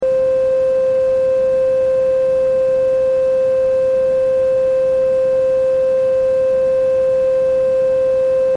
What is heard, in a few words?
Concentration loop Dirty Sine; phet io wrapper sonification; molarity